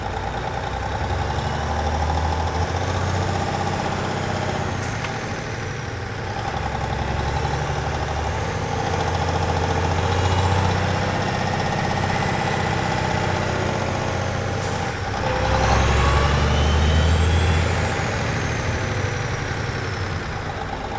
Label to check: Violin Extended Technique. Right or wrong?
Wrong